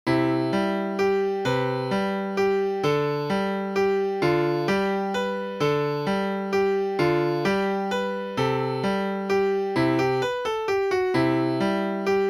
The Organ Grinder´s Holiday, Klavier

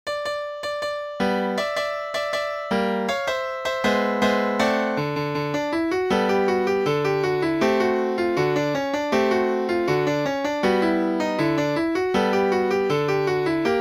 Recital Samba, Klavier

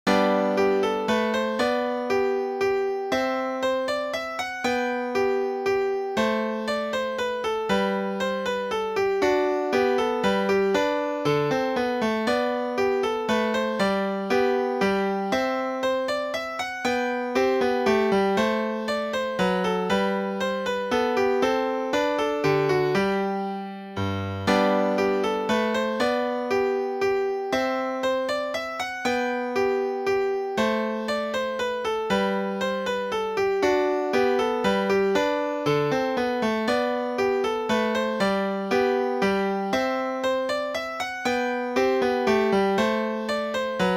Minuet

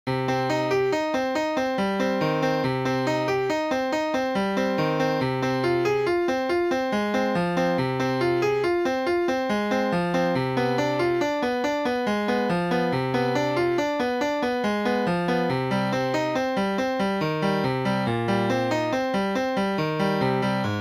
Prelude in C minor, piano

